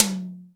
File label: ATTACK TOM; Big Fish Audio; Synth City